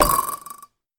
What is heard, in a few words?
arrow hit